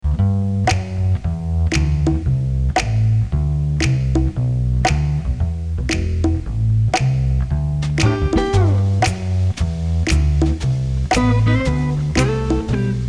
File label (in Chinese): 片头音乐